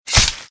impact blade

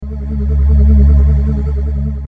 energy warmup; abilities

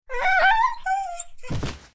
horde wolf death; mobs

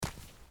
biped run

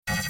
spell timer

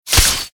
impact blade

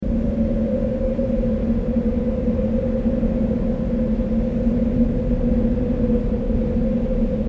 ambient indoor house

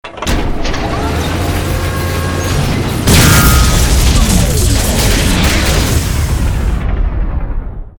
device fail

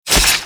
impact blade

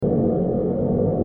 forcebolt projectile; abilities

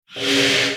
wand autoattack cast

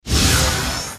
can opener effect; abilities

